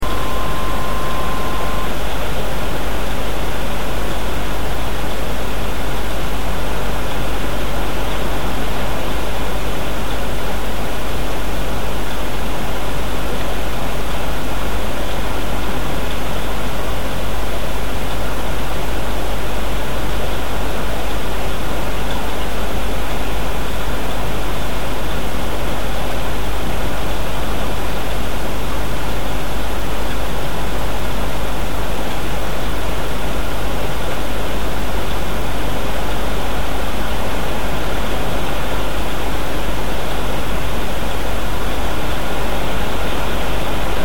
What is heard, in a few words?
Rain